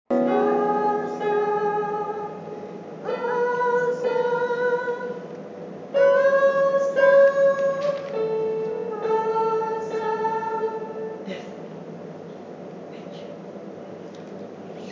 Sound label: Soprano